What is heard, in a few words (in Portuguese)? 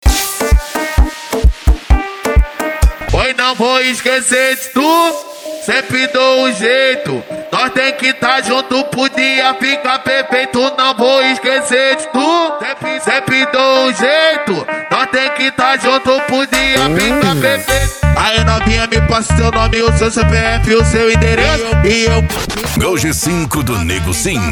funk mega bass e noia dance